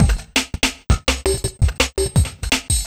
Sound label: Beats, Synchro Funk; Shapeshifter; Sonic Foundry (Sony Creative Software)